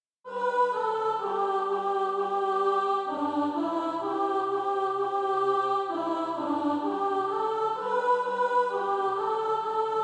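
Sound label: soprano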